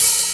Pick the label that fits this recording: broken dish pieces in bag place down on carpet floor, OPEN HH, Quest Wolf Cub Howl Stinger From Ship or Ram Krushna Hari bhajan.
OPEN HH